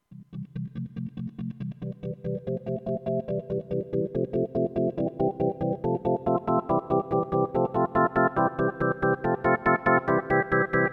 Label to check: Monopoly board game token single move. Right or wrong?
Wrong